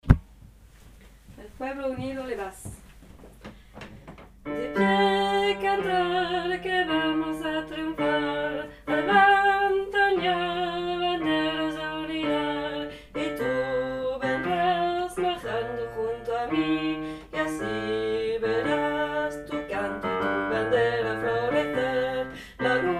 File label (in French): basses